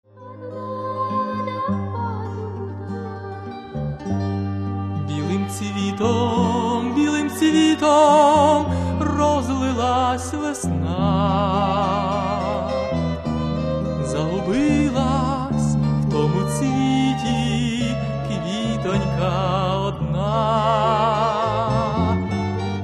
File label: romantic songs